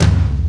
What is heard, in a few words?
tom1, drums